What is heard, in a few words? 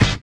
collision; Spectrasonics; Metamorphosis